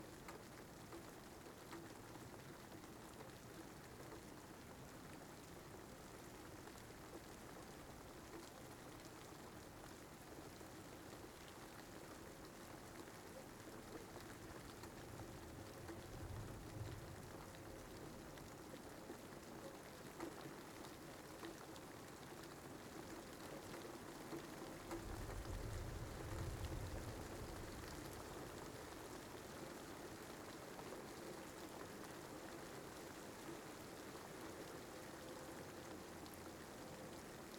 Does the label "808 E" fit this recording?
No